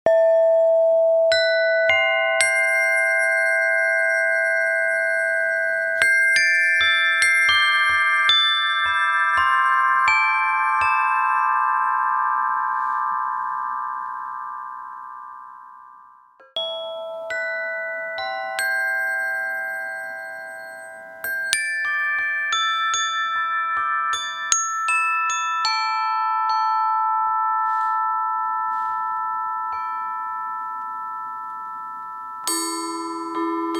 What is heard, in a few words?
chimes